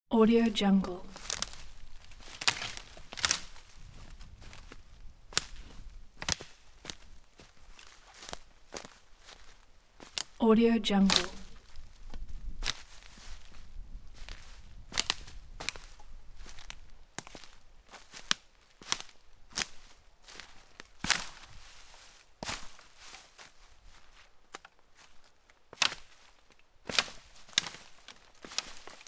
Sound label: walking through brushwood in woods; human sounds